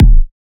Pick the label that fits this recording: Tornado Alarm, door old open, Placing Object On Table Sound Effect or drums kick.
drums kick